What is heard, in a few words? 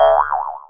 error